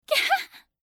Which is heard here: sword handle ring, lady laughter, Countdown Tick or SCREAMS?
lady laughter